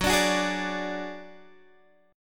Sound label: Gb Suspended 2nd Sharp 5th, Guitar, Standard; strummed; x,9,12,11,9,10